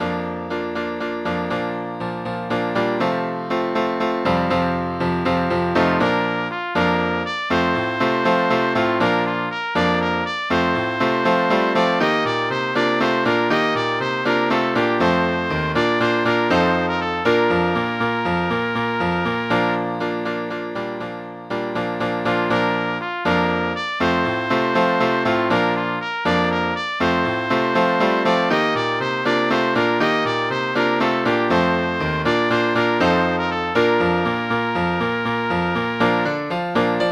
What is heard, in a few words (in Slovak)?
pre tr. a klavír